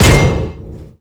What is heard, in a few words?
punch sheetmetal